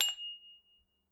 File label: ding soft, dings